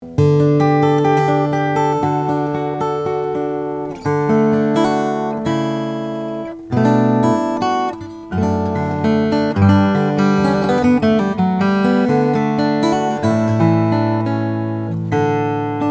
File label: rainsong sg shorty black graphite, guitar